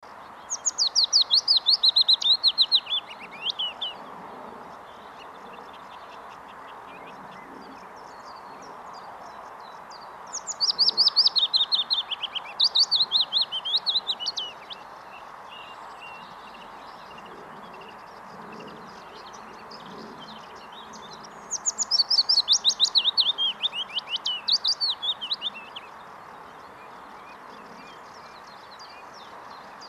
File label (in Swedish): Lovsangare